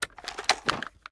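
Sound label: sniper first raise up; gmod; iw8; xmike109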